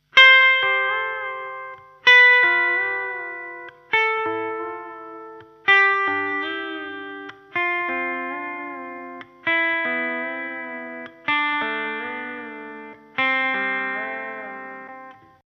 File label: Pedalsteel